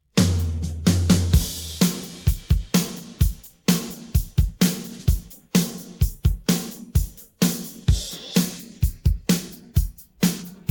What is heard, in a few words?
drums pattern